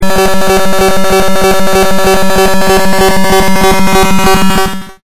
Rickoshay

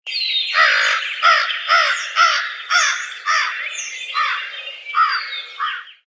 American Crow

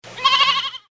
lamb

